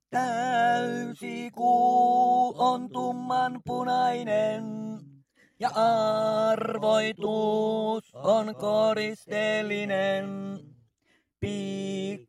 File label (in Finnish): tenori